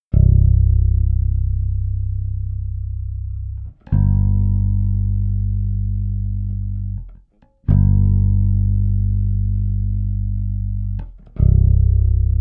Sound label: Blues Sub Bass Track; mike lull